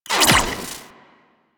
Split Laser Retract; Warframe; Corpus

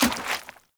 Heavy Grunt Boot Walk Water, Footsteps; Characters; Enemy; Sinecure

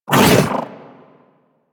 Companion Melee Swipe; Warframe; Pets; Zanuka; Foley

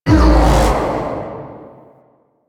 Spear Gun Throw Proj Buff Hit; Weapons; Warframe; Tenno; Hoplite

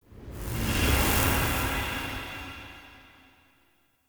Encounter Pillar Dissolve In; Encounters; Ode Pillar

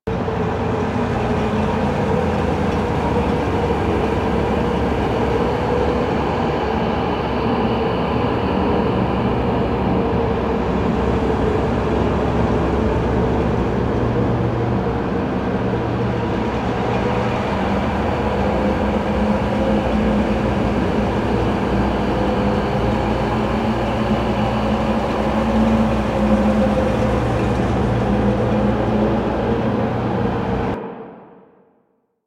Shipyards Interior Amb; Warframe; Ambience; Grineer Shipyards

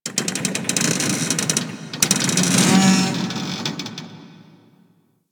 Ocean Close Metal Creaks; Ambience; Warframe; Grineer Ocean

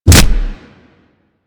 Generic Ability Impact; DE; Warframe; Warframes

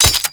Scaldra Pistol Mech

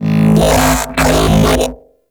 Mech Ambient Vocal

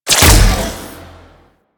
Laser Activate, Traps; Warframe; Orokin; Lasers

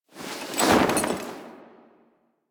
Camp Canopy Flap; Warframe; Quests; Veilbreaker; Kahl Camp

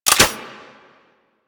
Goo Gun Mech

